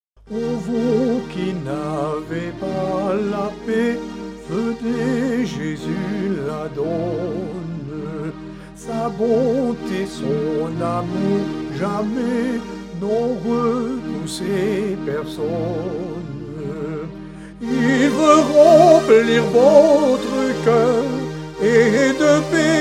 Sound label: Soprano, Cantiques